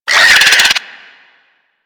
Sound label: Infested Biped Attack; Warframe; Moa